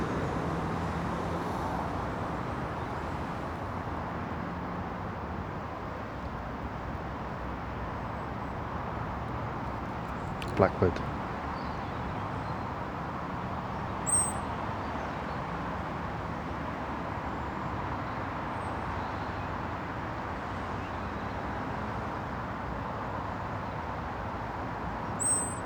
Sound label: urban blackbird replies near road